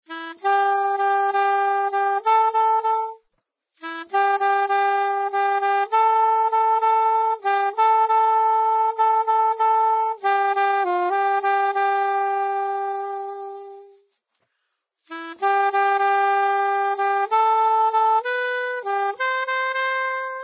Saxophone; Zomboid; Lifestyle

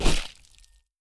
zombie death; Zomboid; More Zombie Death Sounds

